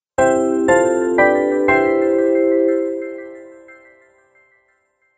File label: Game Award Logo, Video Game Sound Effects